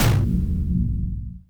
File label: attack